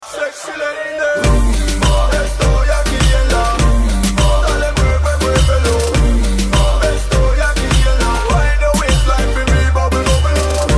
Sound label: rumba; csgo